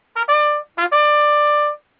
Bugle Call Attention

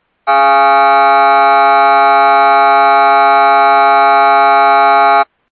Buzzer Buzzer Tone 1318Hz and 760Hz

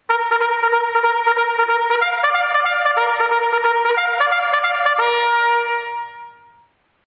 Bugle Call Ground Attack Charge

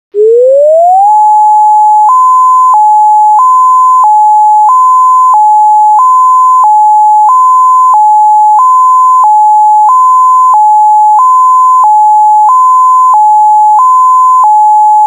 Alternate Steady

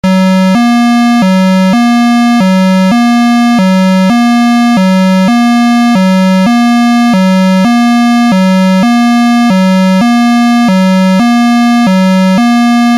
Siren Tone HILO RMBLR

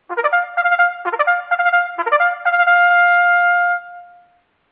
Bugle Call, First Sergeants Call

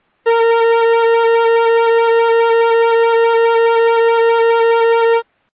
Evac Amplitude Modulated Siren 479Hz

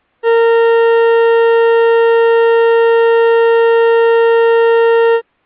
Horn Steady Horn 470Hz

